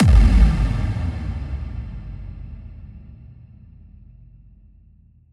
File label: Kick FX